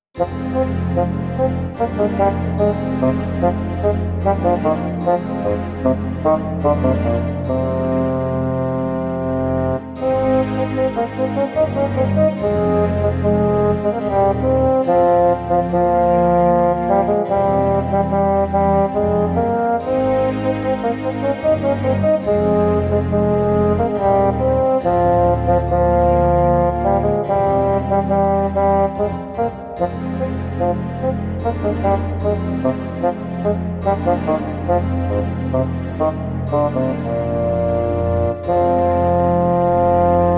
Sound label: Bassoon; VIVO S10